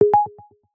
Silent mode off; Samsung Galaxy Watch3 SM-R845F

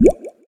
hold bubble lock; SKY IM-A890S-VEGA-Secret-Note

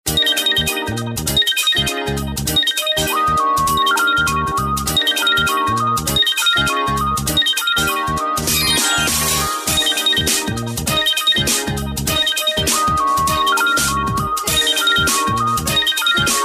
Watercolor painting, Samsung; SGH-A747

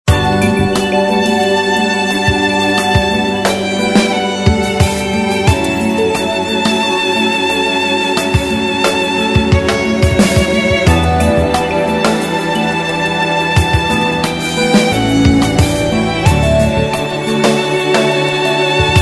Autumn Wind; LG KX266

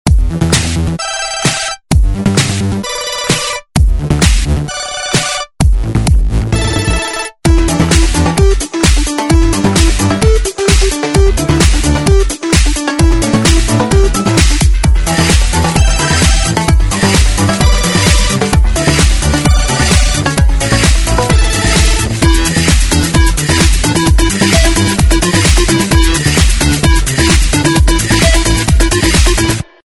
Ringing to you; Samsung; GT-C3782 Evan

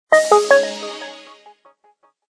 Power Off

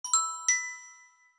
blare, notifications; OnePlus 7T

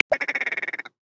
Willow Grouse; Xiaomi Redmi-8A

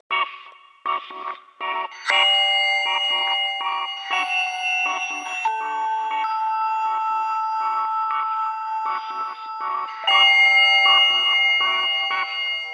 Folding pulse, Ringtones

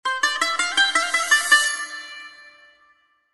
Arabic melody; LG KP500N Cookie MEA; Message tones

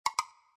updown, Keytone sounds Music Scale

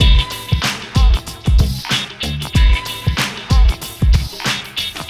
Hiphop, Loopy Lounge, ringtones; Google; NewWave Labs

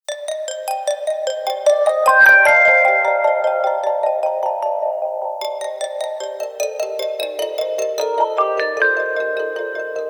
Dark Alley, ringtones; Motorola MOTOLUXE-XT615

